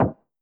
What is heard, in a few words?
player left; Multiplayer; osu